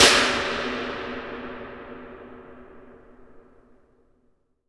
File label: IMP SNR DRUM, Drum, Cinematic Impact